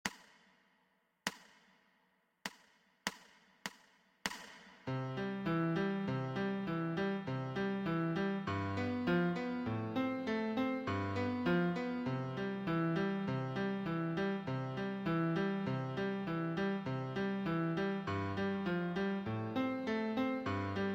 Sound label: Au Clair BB Accomp